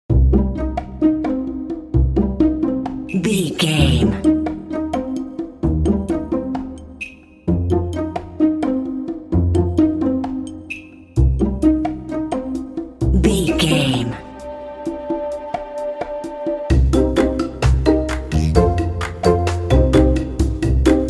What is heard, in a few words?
tense dramedy pizzicato